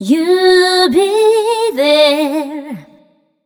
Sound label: f mel d, fem Vocals